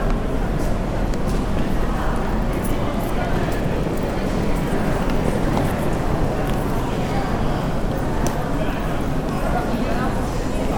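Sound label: Narita airport arrival; 機場/到達登機門1; Tokyo; 成田機場（千葉）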